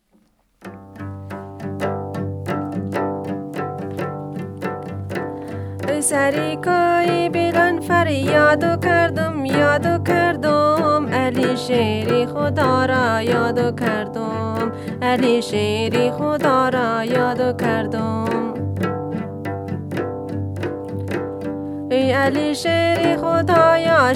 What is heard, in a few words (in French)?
chanson